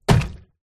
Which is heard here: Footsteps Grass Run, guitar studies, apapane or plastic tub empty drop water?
plastic tub empty drop water